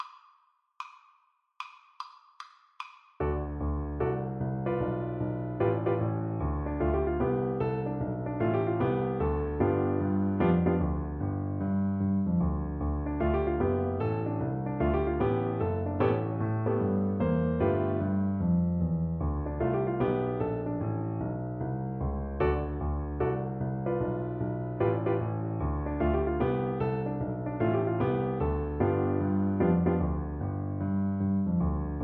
shuffle, cello

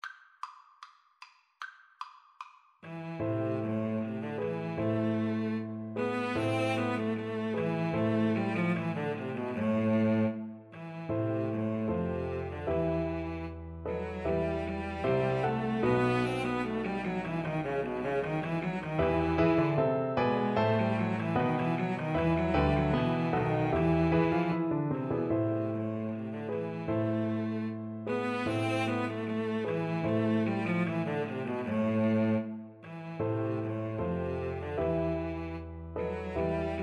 Cello Duet

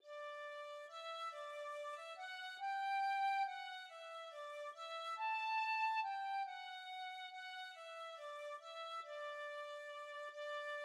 tin whistle

